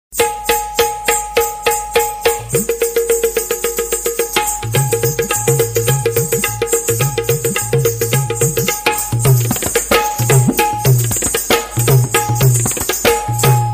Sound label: Marathi Dholki